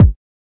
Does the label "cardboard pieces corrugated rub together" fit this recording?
No